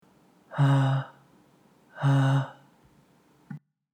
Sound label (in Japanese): 息漏れの地声